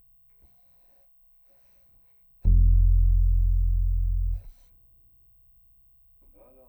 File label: C Bass DI